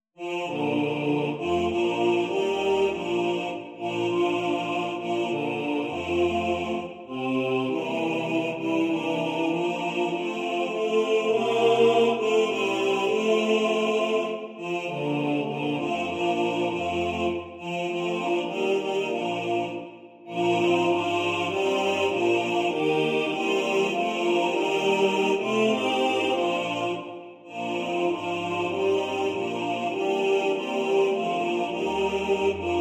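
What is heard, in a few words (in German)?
Bass1 im Vordergrund, Männerchor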